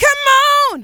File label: VOCALS, House